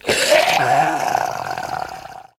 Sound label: zombie death, monster